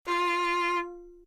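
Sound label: violin fa; violon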